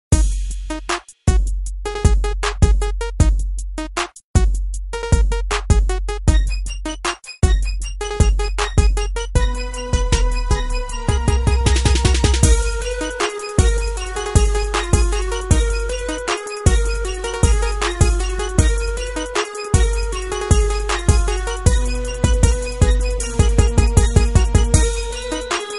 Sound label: Gangsta Beat